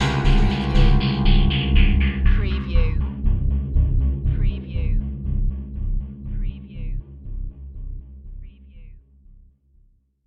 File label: Stab Piano